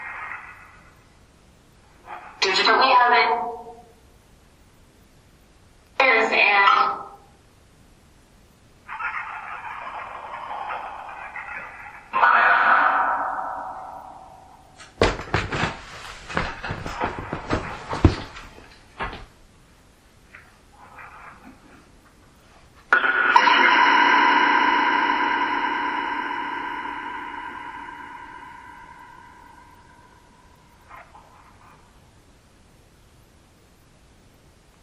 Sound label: Canopy Room Phasma Box